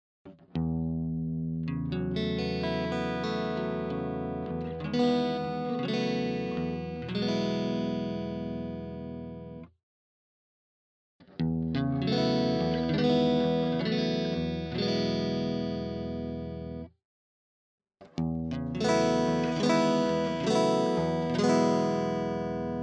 RC-600 Amps Overviews

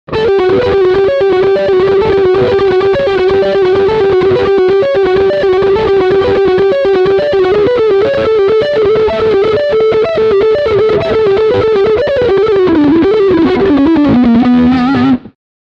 Picking Front Singlecoil

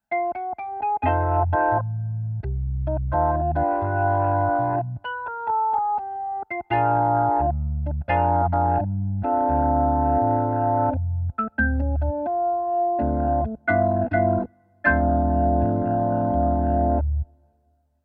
Yamaha YC88 Organ Jazz Lead

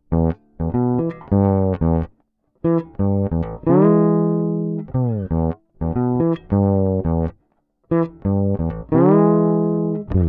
Fretless Thump DI

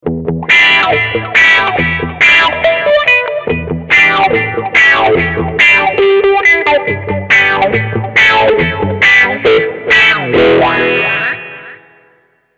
Vypyr 100 Plexi

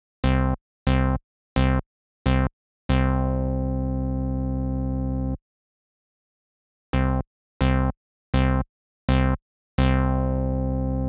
UAD V76 Synth Test Off On Off On

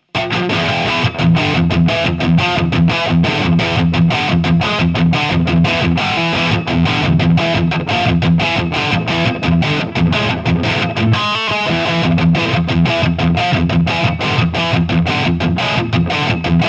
Diezel Blue Channel

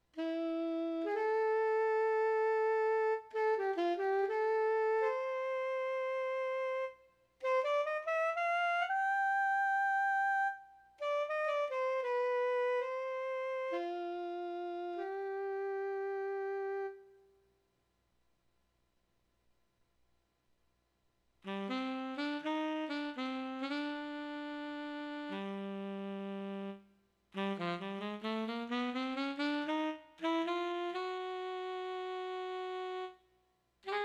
Woodwinds; Prodipe

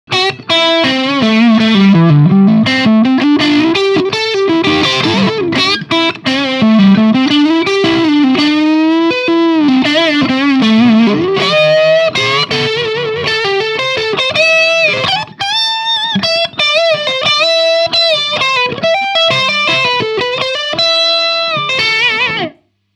Blues Lick Distortion

